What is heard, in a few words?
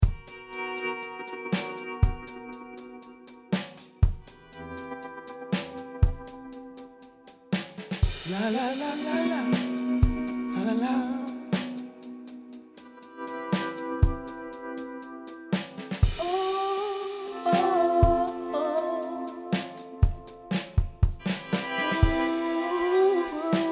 nobass; odissea